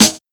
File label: treble heavy snare drum sample g key, snare drum sample; snare drum samples